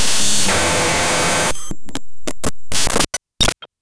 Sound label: tv glitch malfunction sound